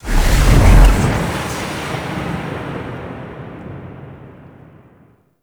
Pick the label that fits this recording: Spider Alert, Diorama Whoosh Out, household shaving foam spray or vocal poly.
Diorama Whoosh Out